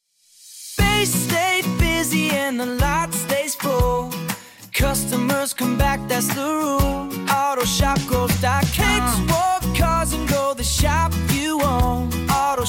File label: jingle